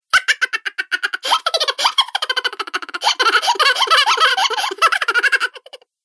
Laughter And Chuckles Cartoon Game Voice

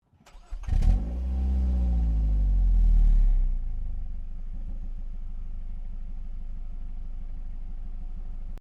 Car Ford Focus Ignition Exhaust; Ford Focus hire car; Cars; Vehicles

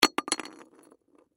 Test Tube Drop, Test Tube Drop No Break; Scientific Medical Laboratory; Hospital; Emergency

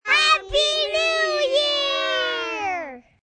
Kids New Year, Cheering, group of children

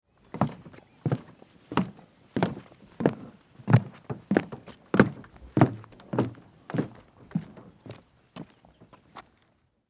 Footsteps Boat Dock Walk Short Distant Birds; Human

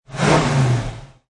Mustang 68 Fastback Rev Engine Perspective, Car; Cars

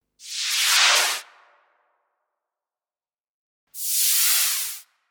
Firework Whoosh, 2 x firework whooshes; Sound Design

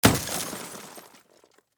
Impact Automobile Window, Shatter; Glass; Crashes; BlastWave

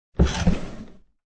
Drawer Open, Dresser drawer being opened

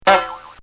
Cartoon Sound Effects Bong; Cartoon Sound Design; Bobby Cole

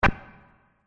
Multimedia Button

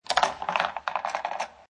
Billiard Pool Snooker Ball Falls In Pocket; Pool ball, snooker ball, billiards ball; Sports; LynneMusic

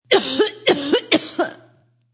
Fem Cough, Female coughing sound.; Sick; Human

